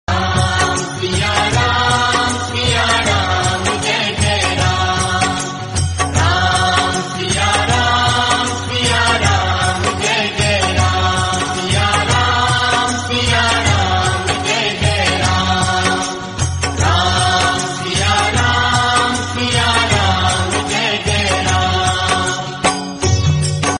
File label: Female